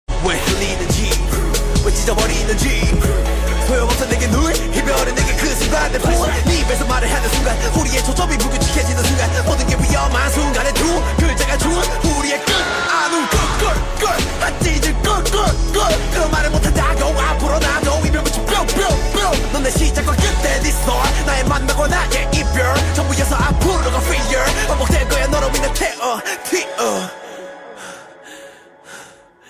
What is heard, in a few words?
korean ringtone